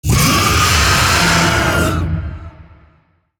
Vocal Attack; Warframe; Zariman; Void Angel

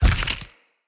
Claw Large Impact Flesh, Enemy Unarmed; Weapon Impacts

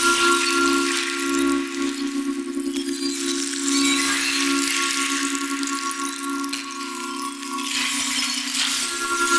Citrine Protective Shell Loop; Warframe; Warframes

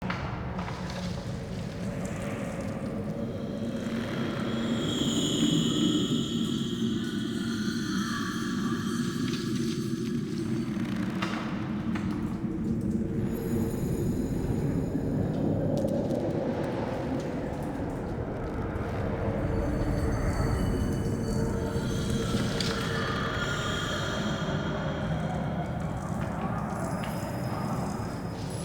Shrine Interior Loop

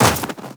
Tenno Run Snow, Footsteps; Warframe

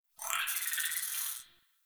Tree Critters Angry; Warframe; Duviri; Ambience

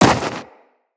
Run Snow, Footsteps; Characters; Tenno

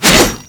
Whoosh, Weapons; Infested; Coda Caustacyst

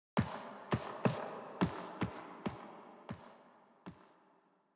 Steps Operator Close, Foley; Warframe; Cinematics; Apostasy

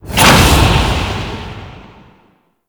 Douse; Warframe; Ember

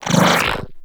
Bullet Attractor Cast; Warframe; Mag

